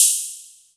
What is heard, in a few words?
Hats, Elektron MachineDrum